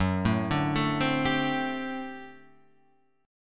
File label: G Major 7th Suspended 2nd Suspended 4th F sharp